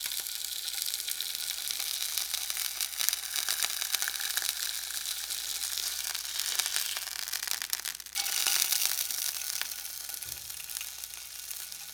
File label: Cooking Pan Sizzle; Home & Office